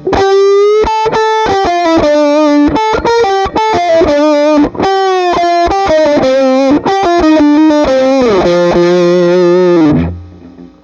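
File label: Pedal 5, guitar, Treble Booster; Guild 1984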